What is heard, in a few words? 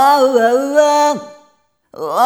Rhythmic G